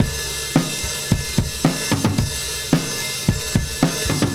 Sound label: R&B Drums